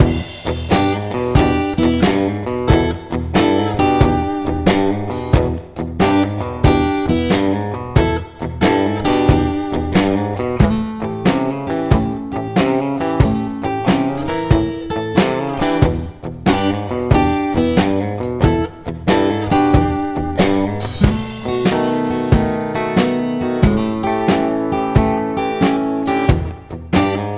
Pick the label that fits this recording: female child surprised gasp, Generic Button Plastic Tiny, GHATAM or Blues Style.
Blues Style